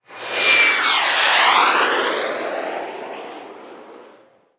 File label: missile fly by; Weapons; 1001 Sound Effects